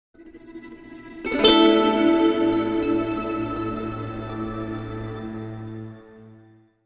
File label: Dark Tension, Sting